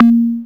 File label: A#, Synclav FM PERCUSSION; Big Fish Audio